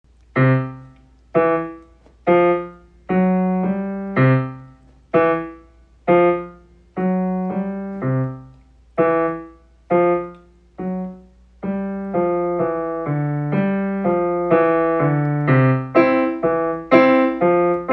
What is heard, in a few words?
Dinosaur Stomp, Home Recorded, student recordings